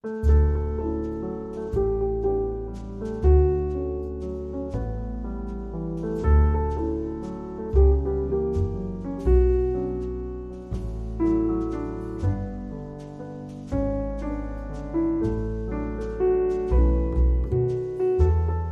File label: With Trio Strings